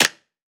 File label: clap, hip-hop drum kit